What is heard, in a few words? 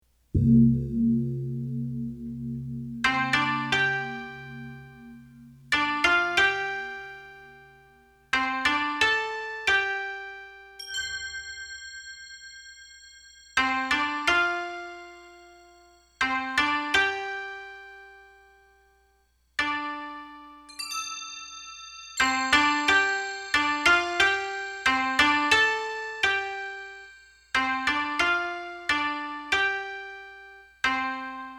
Koto